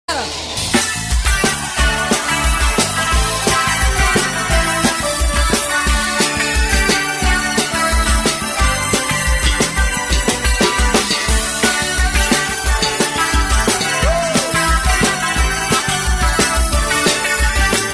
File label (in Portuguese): banda ao vivo; no piaui